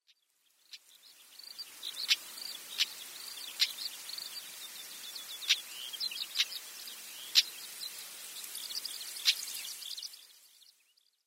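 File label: kuronobitaki, Pied Bush Chat; akune; koe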